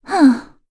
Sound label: Vox Sigh; FreyB; kingsraid; voices heroes